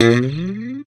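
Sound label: slap, bass; Xsample VOL-1; SLAP+THUMB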